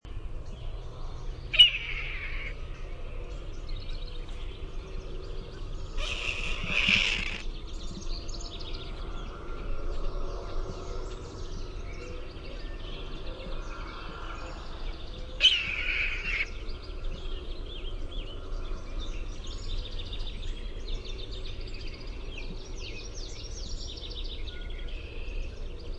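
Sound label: Red-tailed Hawk scream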